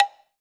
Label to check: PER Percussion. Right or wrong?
Right